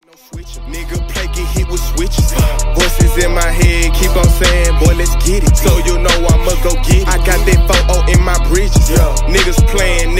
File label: Penny Key Glock